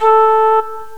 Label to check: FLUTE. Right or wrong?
Right